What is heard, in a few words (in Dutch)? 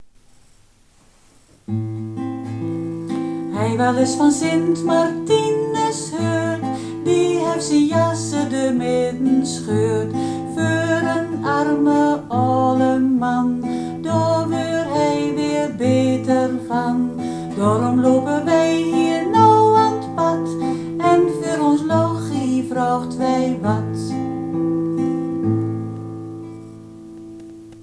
Sint Martinus drents